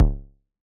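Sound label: Bass Drum Analog Distortion; Yamaha DJX-2B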